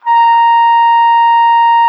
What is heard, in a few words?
TRUMPET A#5; Xsample VOL-3